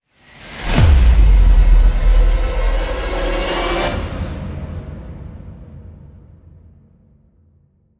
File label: Stinger Modulate ramp; extinction deluxe edition; ps4 windows xbox one